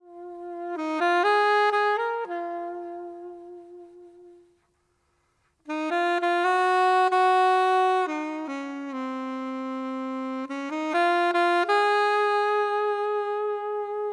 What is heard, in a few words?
Saxophone Introduction; Psalm 65